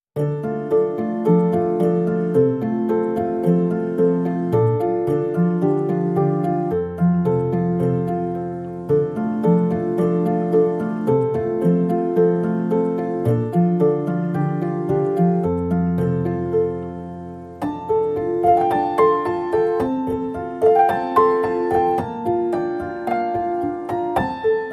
Solo Pianos

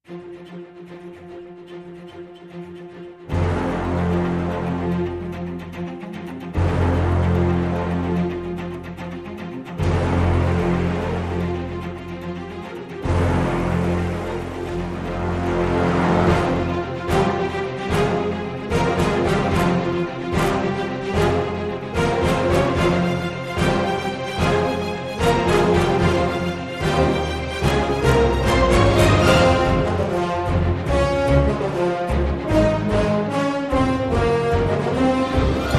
Light Perc

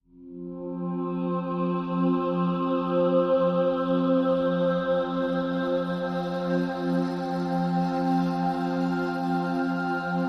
Natural Element F, No Flute

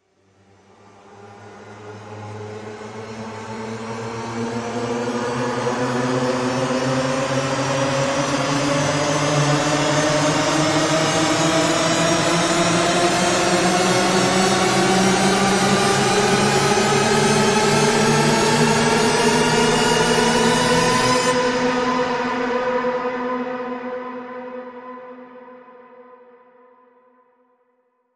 Rise Long

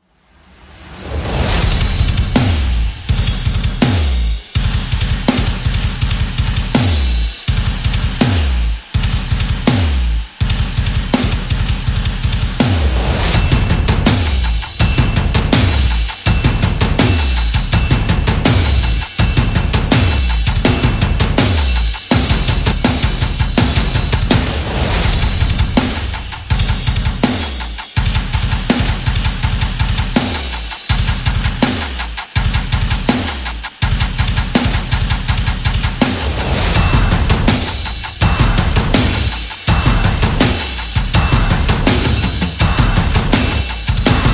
Percussion Only

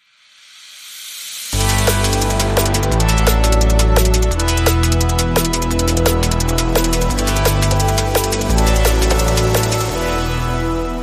Breakbeat, Rhythm

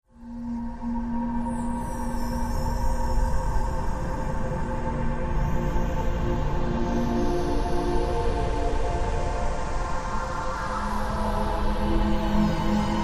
Piano Ostinato

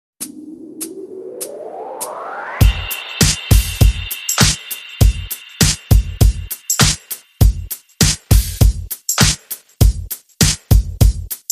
Sensual Stand Beat

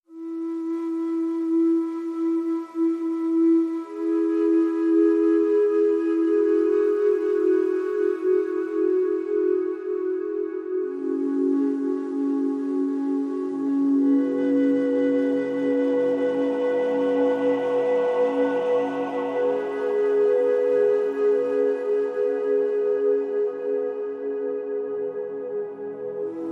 The Good Earth Flute & Choir

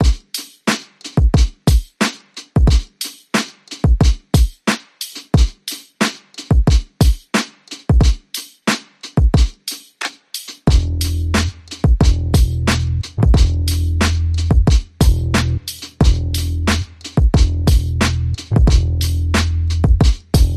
Drums and Bass Only

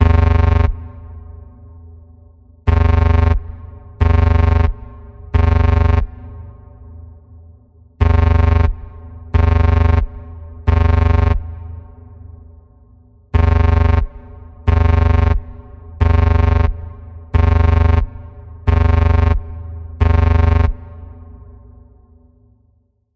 Emergency Death Code E, Key of E

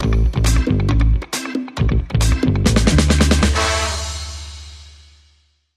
Bumper

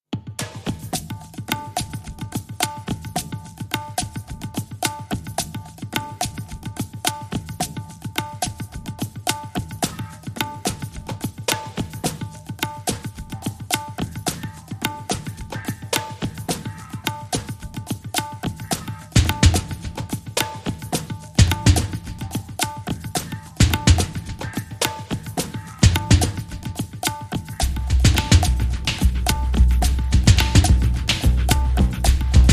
Bass, Rhythm

